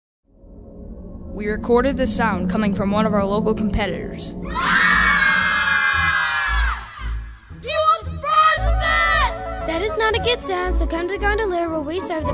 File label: Jingle